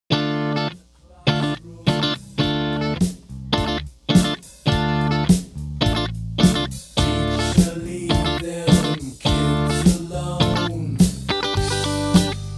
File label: Rhythm Dynacomp; Starfinder